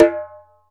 Ashiko Di; Multisamples; Digital Sound Factory Planet Earth

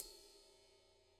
RIDE, Drum Kits

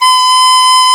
Didzi B4; Multisamples; Digital Sound Factory Planet Earth

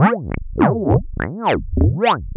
Noise, Prototechno; LFO & Noise; Sonic Foundry

